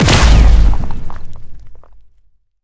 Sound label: hit; smite; heimdallr